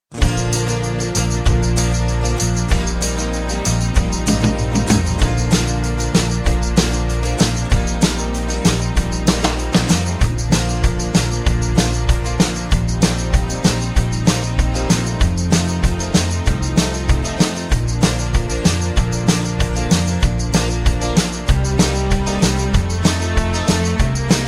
(Bb) No Backing Vocals

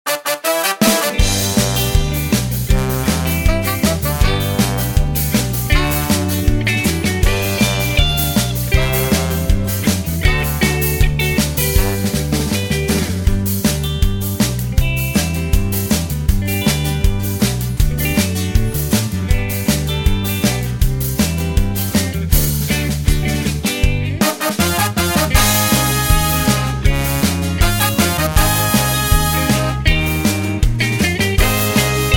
(G To A) No Backing Vocals

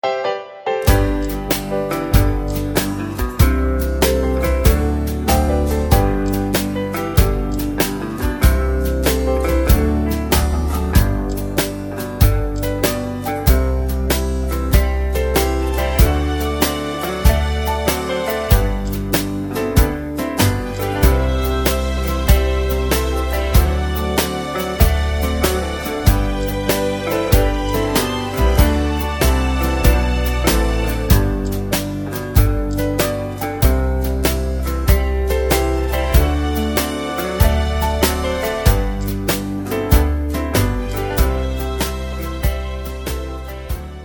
(G) No Backing Vocals

